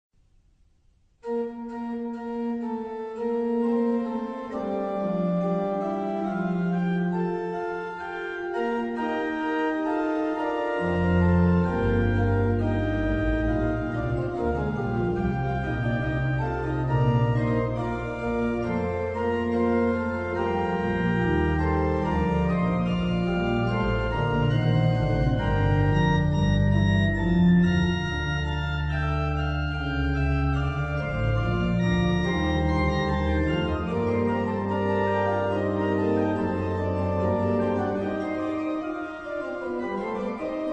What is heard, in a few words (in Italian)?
organo; Clasica; BWV 702